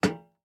Step Iron; MiSide

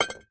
Sphere Drop; Game rip; MiSide

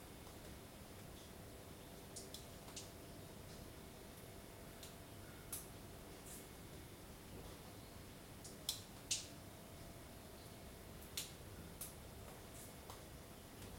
Ambient Room; Game rip; MiSide

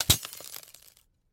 Interface Broke; Game rip; MiSide

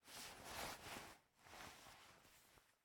Sword Drop; raw game audio files; Mila; MiSide

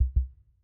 Low Health; Game rip; MiSide

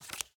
Drop Card; Game rip; MiSide

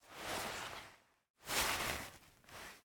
Player Take Cartridge; Game rip; MiSide

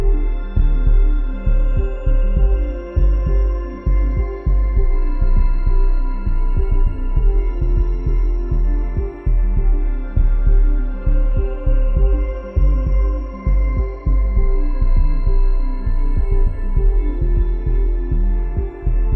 Ambient Backroom; MiSide

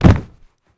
Fall; Mila; PC; MiSide

